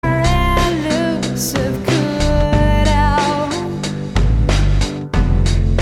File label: Tone Bee